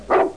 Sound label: Single dog bark deep